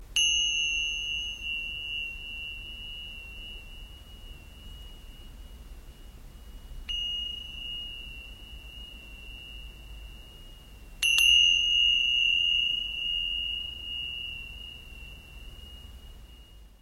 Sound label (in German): Zimbel